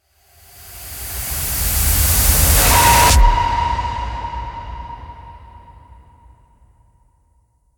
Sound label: Main Ambient; Doom Eternal; PS4; Stadia; Xbox Series X/S; Blood Harvester